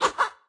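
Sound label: Chicken base attack, 经典及以上形态攻击音效; 母鸡会用自己的喙不断地啄对手; 卡通农场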